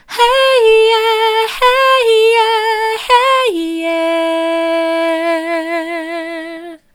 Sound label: hey soft, vocal